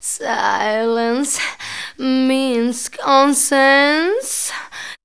SILENCE; Best Service; Voice Spectral II

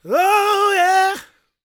GOSP MALE, Vocal Planet Gospel

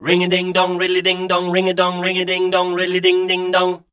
SCAT #F, Voice

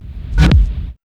REVERS

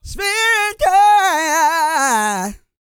GOSPEL

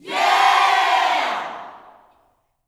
"YEAH", Gospel, YELL SHOUT; Spectrasonics; Vocal Planet Vol.1 Gospel; akai

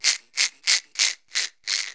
FX, CROWD FX; E-Lab; X Static Goldmine 2; akai

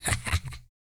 LAUGH, Vocal Jazz Fx

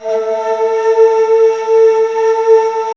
BAMBOO; E-Lab; X Static Goldmine 1

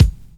BD 70 S; E-Lab; X Static Goldmine 2; akai

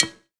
CBELL; E-Lab; X Static Goldmine 2; akai

